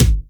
trebly kickdrum sound A key, Royality free steel kick drum tuned to the A note; kick drum samples